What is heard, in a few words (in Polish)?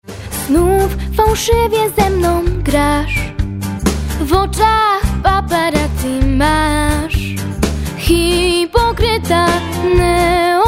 piosenka